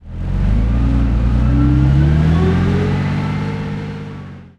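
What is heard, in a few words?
centurion engine interior load, tanks; engines; War Thunder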